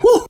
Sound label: "hoo"; Voice; ooh ahh